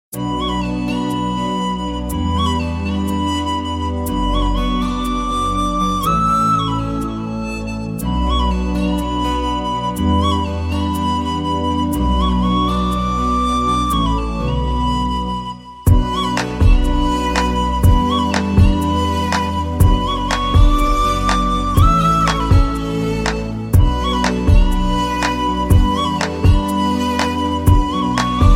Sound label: nice flute